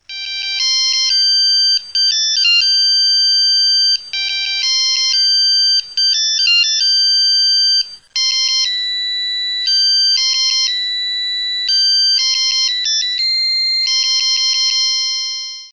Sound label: polyphoner klingelton